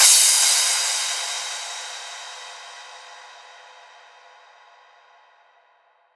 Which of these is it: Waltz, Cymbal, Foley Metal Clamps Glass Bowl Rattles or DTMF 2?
Cymbal